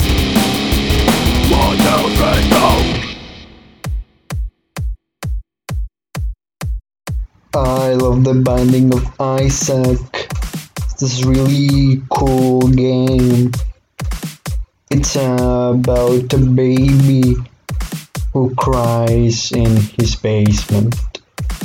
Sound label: TECHNO ANTHEM; Excelsior OST 2021; BINDING OF ISAAC